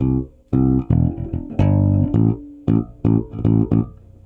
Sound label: Syncopotion, Bass Drum Kicks